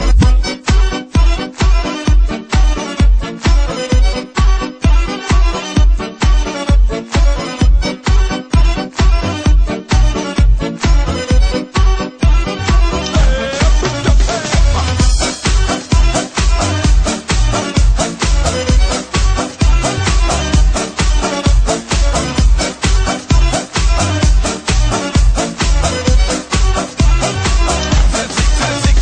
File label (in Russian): balkanskaya muzika